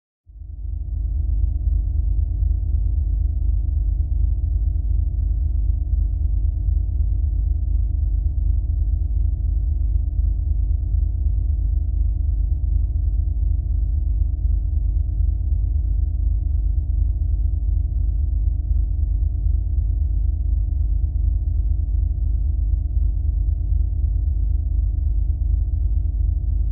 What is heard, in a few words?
Space Ship Hall Hum